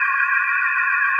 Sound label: windy witch run; Seer; GamigoNA